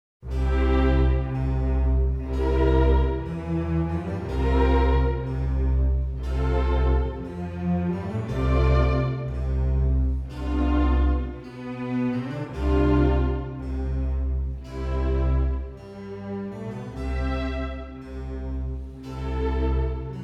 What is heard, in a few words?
Violin Concerto; Bach; Brandenburg Concertos; Bach (JS)